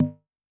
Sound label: Ana Lo, Yamaha DTXpress-II Tom